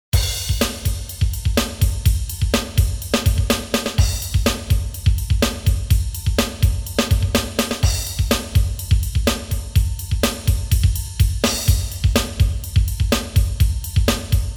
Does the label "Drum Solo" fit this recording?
Yes